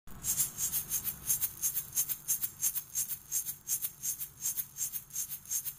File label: shaker; بررسی و آموزش ساز شیکر